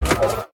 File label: turbo on; Zhao Cai Tong Zi